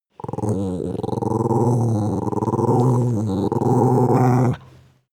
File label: dog small growl wavy